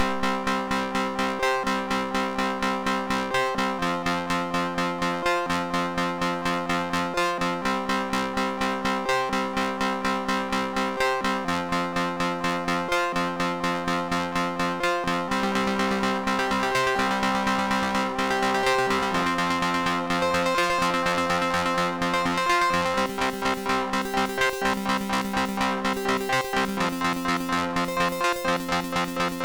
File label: Guitar, SID, MOS6581R2; Guitar Flan